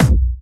Medium, kicks

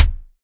Kick Dry

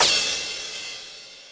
CYMBAL, Yamaha RM 50

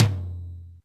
Yamaha RM 50 Toms

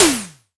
SFX, Yamaha RX-5; Drum Samples

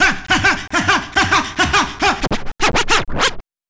Scratch

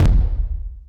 kicks, Bass Drum

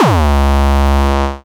Bassdrum

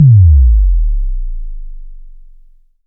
Roland SH-09 Bassdrum

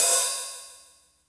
Real oh, Roland MC-303, Cymbals

